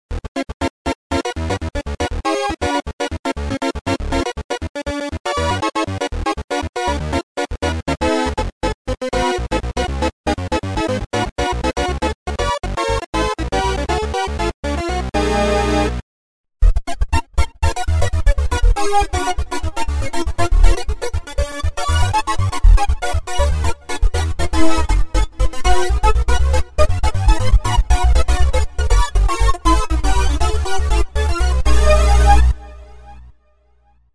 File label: Synth C